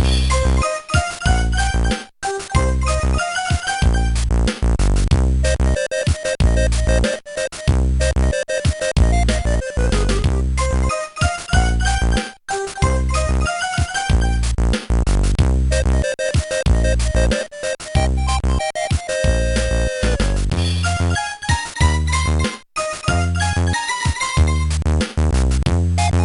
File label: underwater